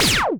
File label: laser